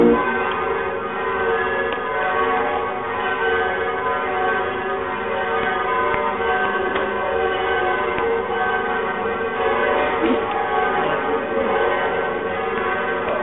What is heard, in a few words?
Bell